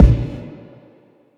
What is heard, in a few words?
reverb kick; Kicks; drumkit